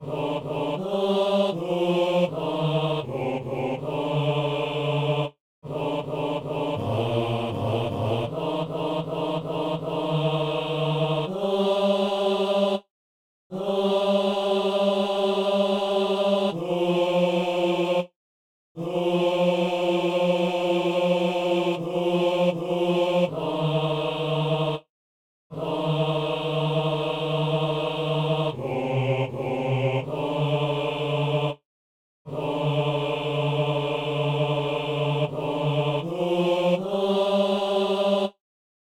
psaume, basse

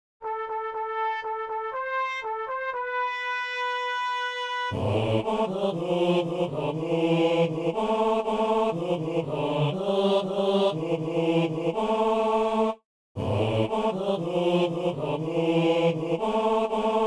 chantre et basse; Messe de Saint-Paul - Alleluia

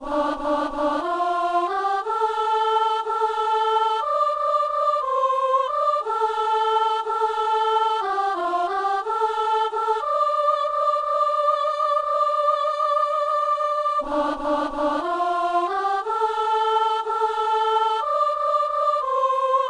messe simple, gloria, soprano; Messe du 17 janvier à Saint-Denis

